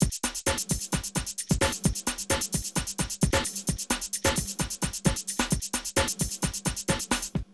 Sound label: Drum loops, Rave & Hardcore; Zero-G Groove Construction (1993)